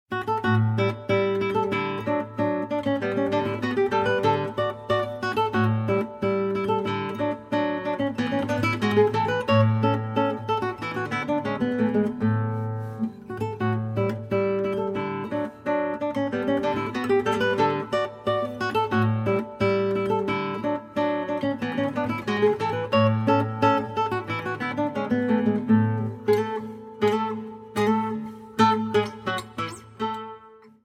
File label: polka; majkapar